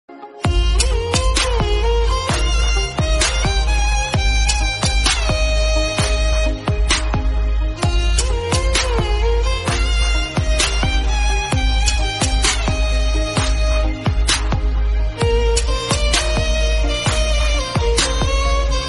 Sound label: rain relaxing bgm flute